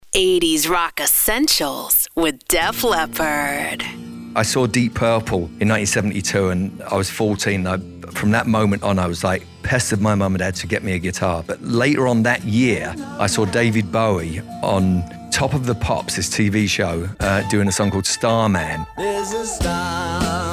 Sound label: 80s Rock